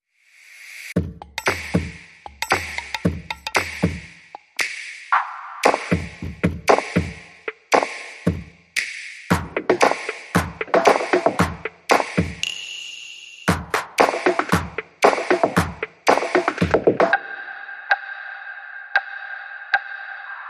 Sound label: No Kick No Bass